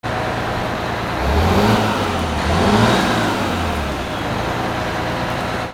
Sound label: VEHICLES